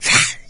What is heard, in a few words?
melee, maggot; doom3